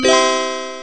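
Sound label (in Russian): электронный звук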